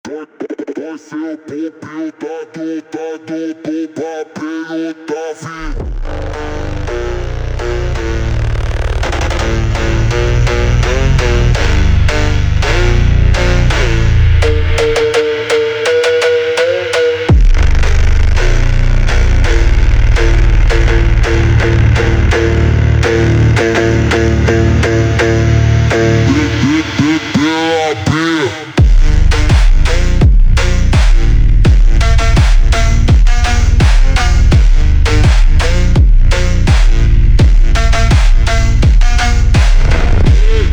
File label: funk